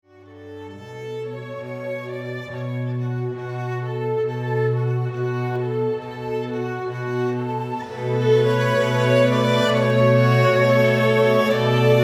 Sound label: ORCH